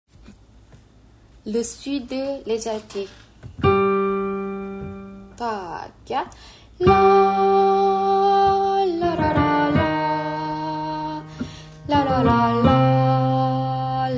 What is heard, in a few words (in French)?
alto